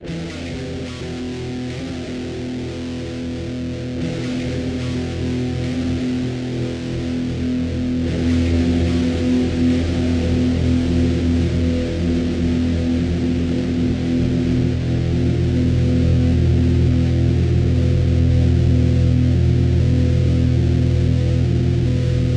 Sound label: Pensive, Brooding; darkages